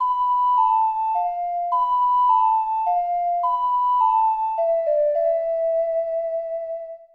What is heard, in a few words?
Ocarina; Legend of Zelda The Majora's Mask; N64